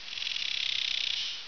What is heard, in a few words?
bug